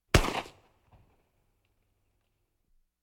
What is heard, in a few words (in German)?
ein gewehrschuß; Urwald